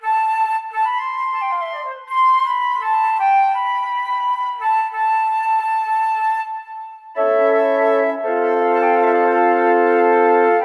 Intro Music; Rocket Knight; Windows

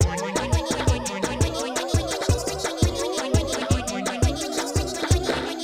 Clockwork Machines - Human; LSD: Dream Emulator; PS1

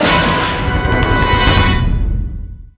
Jingle End Race Bad; Garfield Kart Furious Racing

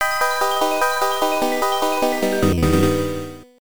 Communication VS Defeat; Spin Pair; TurboGrafx-16

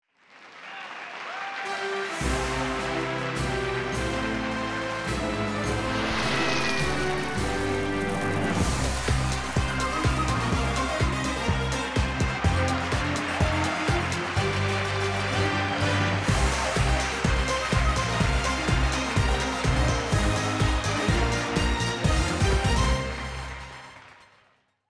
Intro Fanfare; buzz brain of the uk 2009 ps2

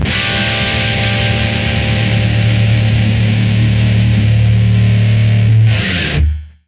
Guitar Power; Back to the Future The Game; Android iOS PS3 Wii Windows Xbox 360 gamerip 2010

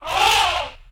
Monster Mammoth type Attack; Inotia 3

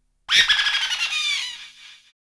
Coin Sound; Namco Video Game Graffiti Volume 9

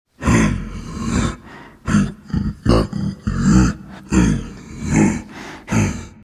Mob walk

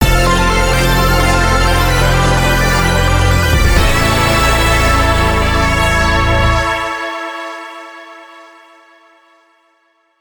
Excellent Fanfare; bejeweled twist 2008 pc gamerip

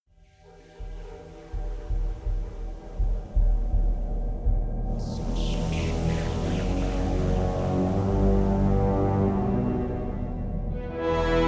Suspense; Under N. O. R. A. D.'s Nose; KemSynth; Suspense III

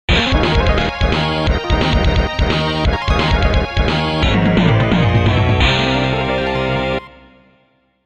Unknown Fanfare; hot wheels burnin rubber gba

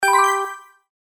Push; pucca n friends ios

